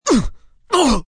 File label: Grunt Multi, Grunt, groan, impact, male, two punches, pain, hurt (human voice); Groaning